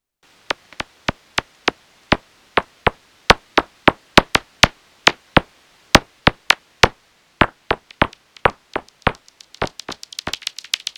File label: E isabellinus, Extremadure